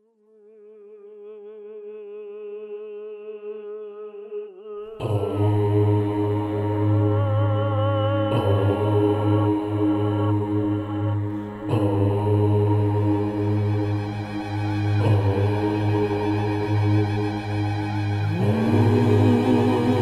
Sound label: Vocal Tars